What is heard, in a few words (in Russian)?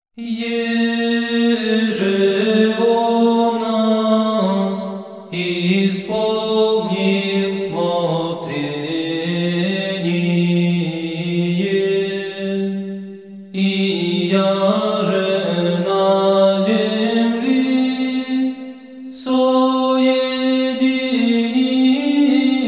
Hor bratii Valaamskogo Svyato Preobrazhenskogo monastyrya Kondak glas; VOZNESENIE GOSPODNE